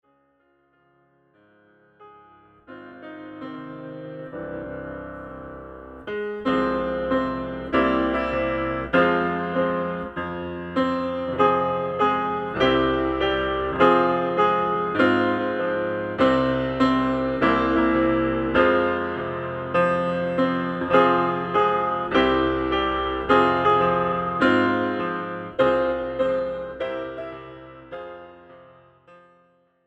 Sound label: PIANO; gospel